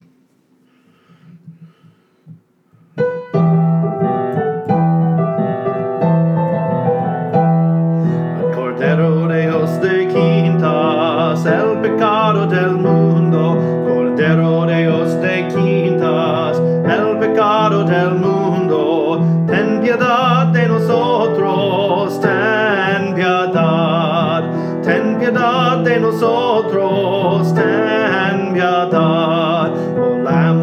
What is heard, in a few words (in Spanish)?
cordero de dios